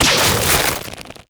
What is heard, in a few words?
barnacle grab player; Half Life Alyx